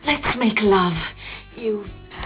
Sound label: light saber